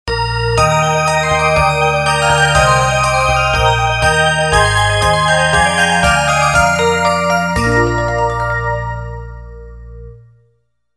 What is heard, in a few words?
melody